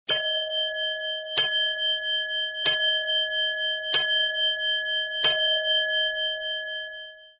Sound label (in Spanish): Campanas de reloj; alarmas